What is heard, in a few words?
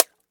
egg; Insects